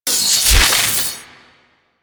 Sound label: Turret Minigun Open; Warframe; Zariman